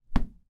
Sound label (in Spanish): Golpe Ardilla